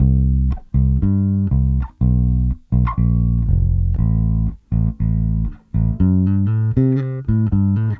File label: Bass, 70s Analog Funk; Sonic Foundry (Sony Creative Software); What it Is!; Classic Caddy